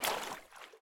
Step Deep Water; Game rip; MiSide

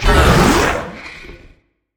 Monster Attack; Game rip; MiSide

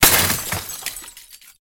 Window Crash; Game rip; MiSide

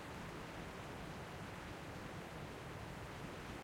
Camera Fly; MiSide

